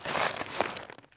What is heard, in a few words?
side rustle; The Sum of All Fears